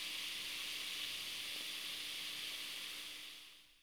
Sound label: sand pouring stopping; vanitas